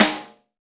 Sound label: Jazz Snare; Snares